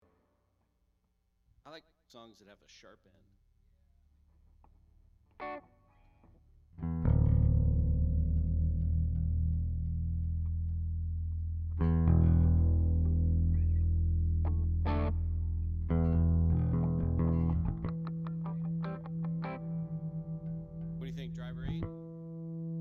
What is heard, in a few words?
Strings Mix